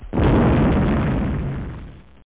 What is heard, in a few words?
E-Mine Explosion; Covert Action